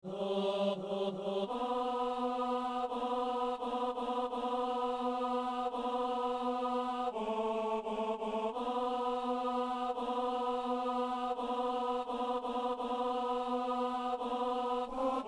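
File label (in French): ténor; psaume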